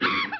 Taunt

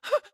Jump

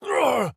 Hurt; Superman

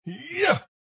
Medium

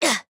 Land; Harley

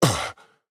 Movement Land; Black Adam

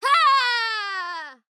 Pain Death; Arya

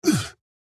AHurt

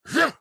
Attack Small; Black Adam

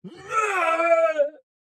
Death

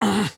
Hurt; Superman

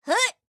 Jump; Harley

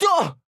hurt

